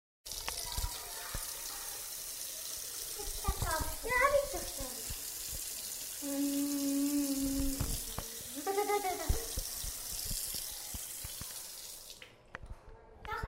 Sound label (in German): kinder am wasserhahn